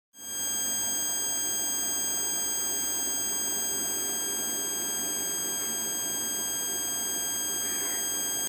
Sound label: Audio Induction Loop Test Tone